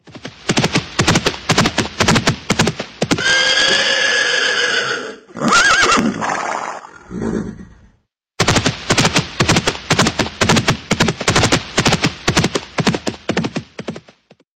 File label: Horse Running Ringtone